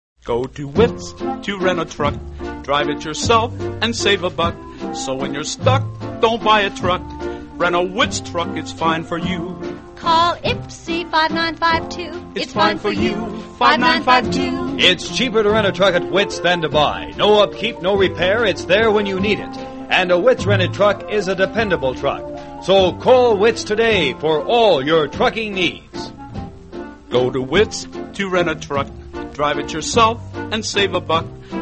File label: radio jingles; witts truck rental; allied radio artists